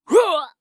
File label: Fairy scrap